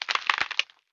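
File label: Crackling ice; Puddle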